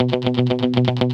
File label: GUI, 120 BPM